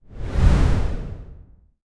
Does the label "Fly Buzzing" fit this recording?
No